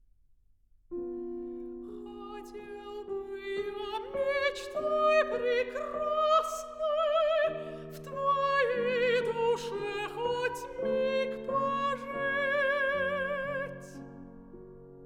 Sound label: romance for voice and piano; alexander scriabin